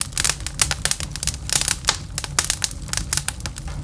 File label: Fire Fireplace Loop